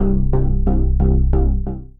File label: Bitten Bass Pulse, Brooding Bass; Instruments; Ableton; Factory Packs; Mood Reel